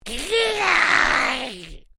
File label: Created creature small yech; Created creatures, vocalisations and pretend speech; Alien Sound Effects